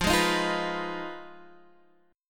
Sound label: F Minor 7th Flat 5th, Guitar